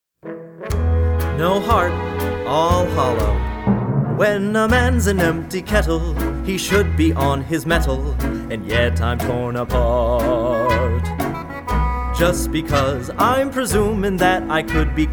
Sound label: vocals